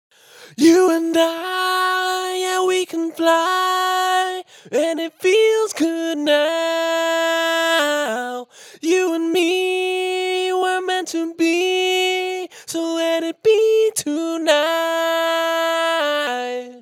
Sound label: Lead; pitch correction for vocal effects